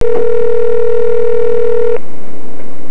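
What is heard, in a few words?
phone ring; Comedians - The People Behind the Laughter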